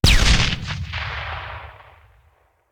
Grenade Hand; Sound Ideas; Guns

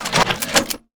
Gun Reload Weapon, Weapons Foley; SFX Kit

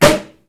Cork Pop Loud; Home & Office; 1001 Sound Effects

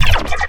Menu Scifi Enter; Menu FX

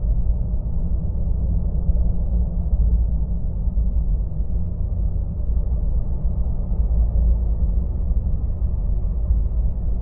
Sea Treasure Room

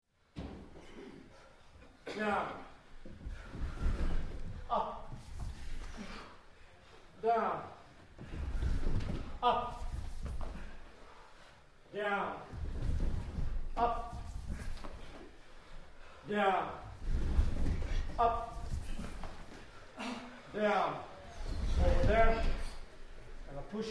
Exercise Push Ups; Sound Ideas 2000 Series

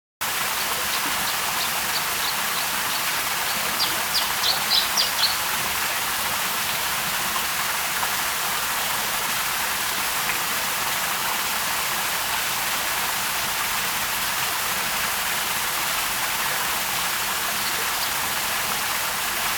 water stream medium; best service Blue Box; water streams

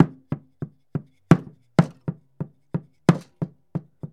Percussion Native Drum; SFX Kit; Foley - Percussion

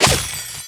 Humanoid Melee Magical; Kilta

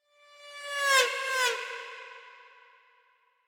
Horn Trumpet Doppler By - Ominous Passing; Hollywood Edge; sound designer's toolkit v2

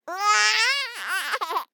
BABIES LAUGH Boy 12 months Amused; Baby Boom

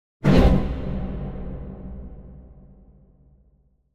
Symphonic Hit Bass Strings Scary Hit; Hollywood Edge; sound designer's toolkit v2

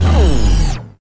Scifi Dome Shield Deactivate; Energy & Forcefields

